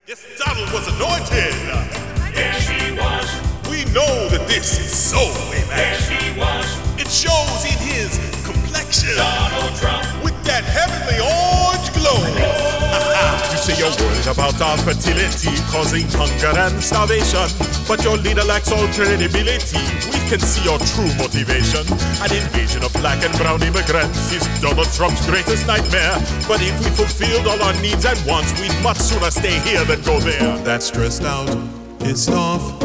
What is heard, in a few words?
Comedy Singing